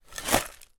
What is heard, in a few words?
food stackable potato chips in tube tilt movement crumbs; pringles